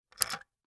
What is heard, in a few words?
gun marlin 336; still north media